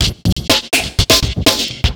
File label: Break, Drum N Bass Percussion; Sonic Foundry (Sony Creative Software); Knutrix Drum & Bass; Fills n Breaks